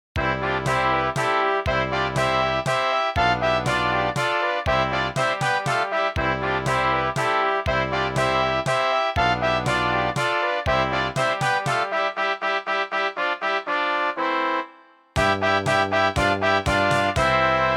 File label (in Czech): Fanfary